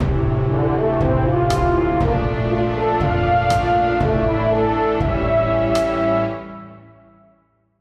jingle cinematic logo

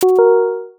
drop soft

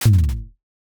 book hard close; foley

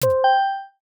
insight pin; video editing

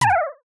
toy hammer piko

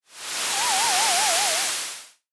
empty winter wind; horror sf

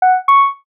focus lock double

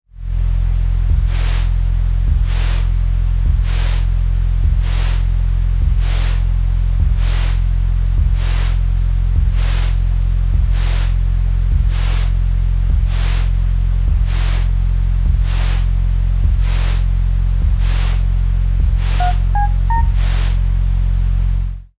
laundry machine soft

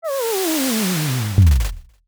portal seal collapse

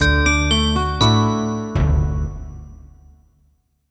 jingle bright

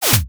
zoom snap

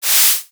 clean air wipe

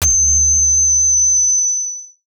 tinnitus freeze

